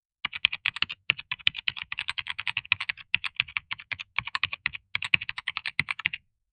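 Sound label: deep satisfying single key, release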